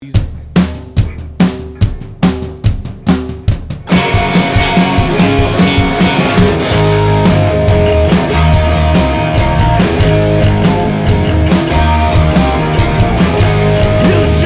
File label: Rock